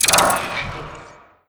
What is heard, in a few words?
impact, physics, vault